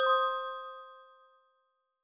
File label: confirm, tones; inteface sfx pack 1